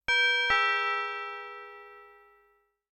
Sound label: Bell, notifications; Huawei P30